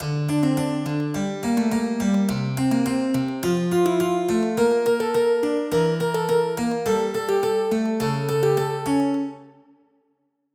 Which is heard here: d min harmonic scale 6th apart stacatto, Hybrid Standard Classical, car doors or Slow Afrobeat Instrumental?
Hybrid Standard Classical